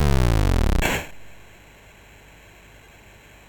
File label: sid MOS6581R2, GAMES; Face Off